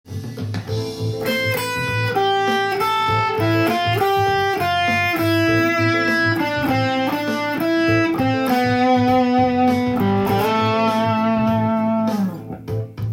a eorian solo

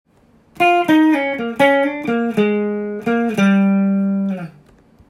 minor major penta, guitar

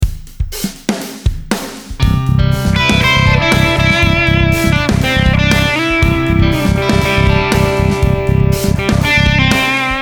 3strings solo, guitar

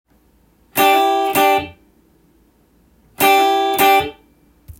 e blues; collection of short e blues phrases tab

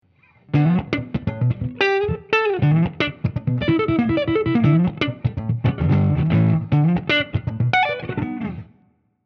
slap tapping sound

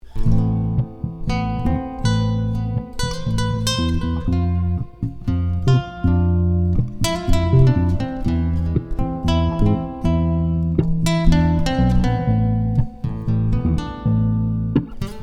guitar bass

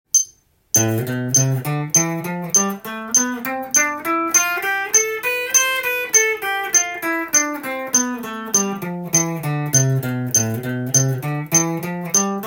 easy picking, guitar; easy picking practice tab

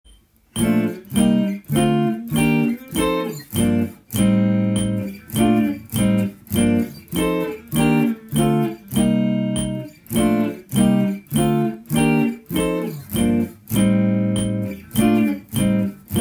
chord; stylish chord changes

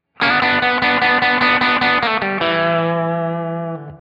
E blues, guitar

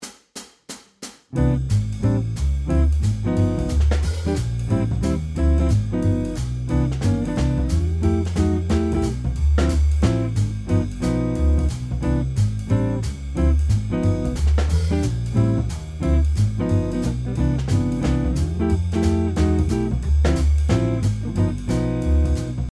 jazz backing, guitar

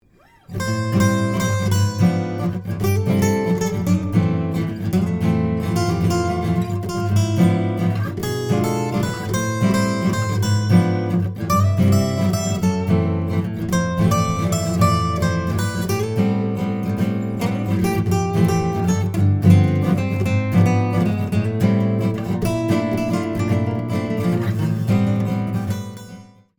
dim7th song

